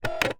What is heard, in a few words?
Single Shunt, DVD Drive External; DVD Drives